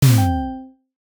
soft product landing

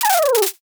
censor mosaic zip

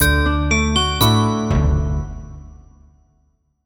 jingle commercial tag

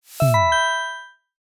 glass product reveal

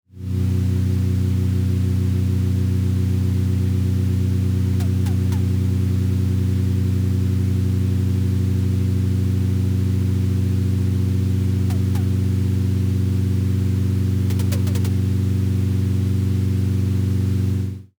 computer fan soft

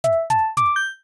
data receive triple; horror sf

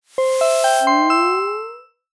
magic curtain open

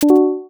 comedy idea spark; reaction

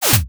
zoom snap

